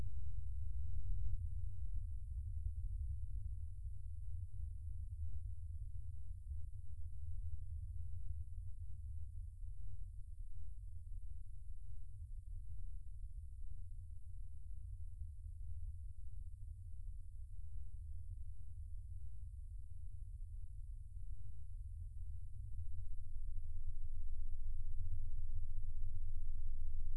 Blue Whale; Passive Acoustic Sensing; CornellWorkshop2017

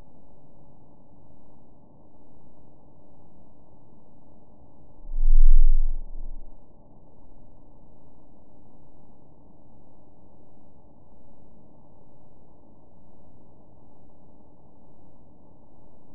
Fin Whale clean; Passive Acoustic Sensing; CornellWorkshop2017; Clipping

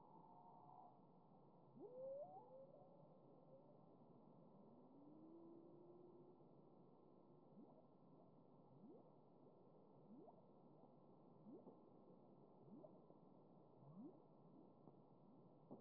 Humpback gurgling; Passive Acoustic Sensing; HARP data

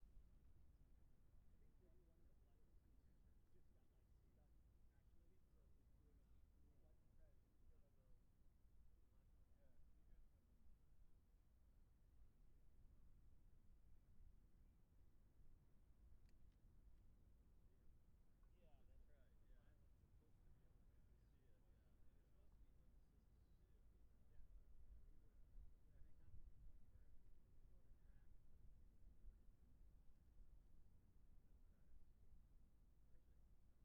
Test1 BackGround, UAV

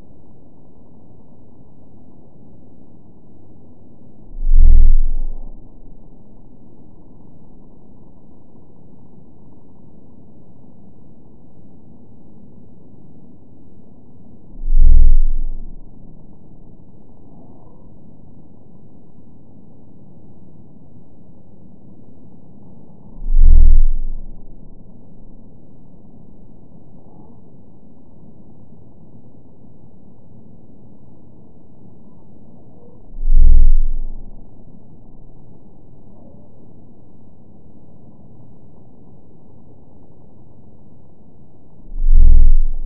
Fin Whale clipped; Passive Acoustic Sensing; CornellWorkshop2017; Clipping

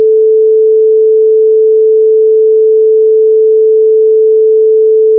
440 Hz tone; Passive Acoustic Sensing; CornellWorkshop2017; Clipping

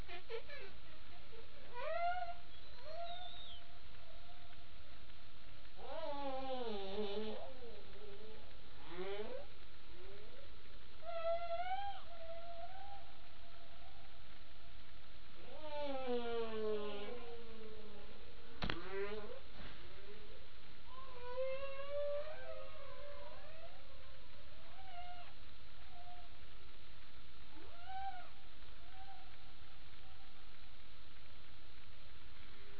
Humpback; Passive Acoustic Sensing; Humpbacks In HARP data

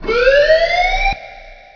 alarms; Multibeam SeaFloorMapping; reson

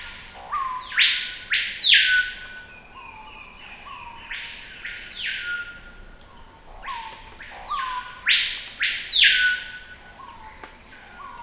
Screaming Piha Aliased; Passive Acoustic Sensing; Aliasing demo; Screaming Piha Aliased Clip; CornellWorkshop2017

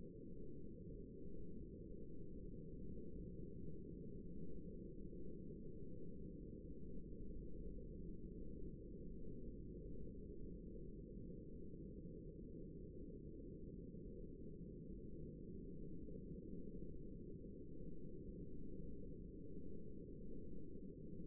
Blue Whale analog Clipping; Passive Acoustic Sensing; CornellWorkshop2017

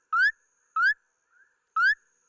Clipping Leptodactylus bufonius; Passive Acoustic Sensing; CornellWorkshop2017

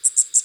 Oriente Warbler short; Passive Acoustic Sensing; CornellWorkshop2017

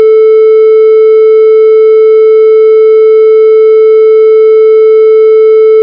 440 Hz tone clipped; Passive Acoustic Sensing; CornellWorkshop2017; Clipping

